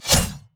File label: basic attack sword; rengar